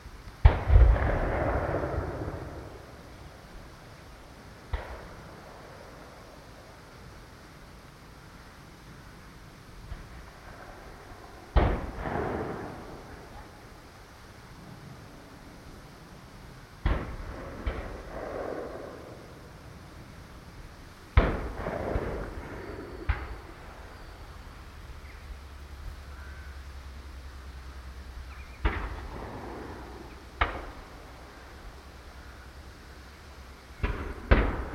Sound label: civil war weaponry binaural; rievaulx abbey north yorkshire